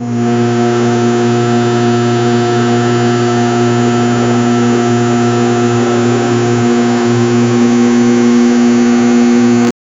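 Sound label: soothing sound of a machine, crafting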